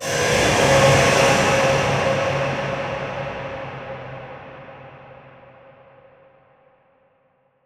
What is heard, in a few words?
Atonal Depths, Psytrance